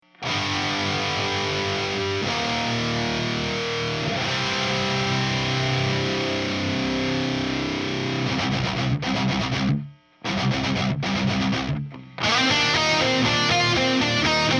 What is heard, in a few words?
amp